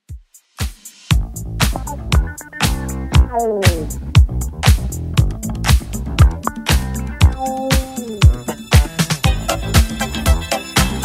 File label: 70s DJ Intro Outro Redrum 115bpm